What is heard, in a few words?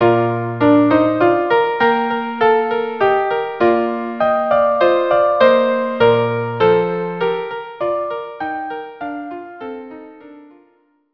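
viola piano organ; Blessed Spirits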